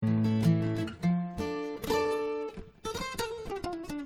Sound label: guitar test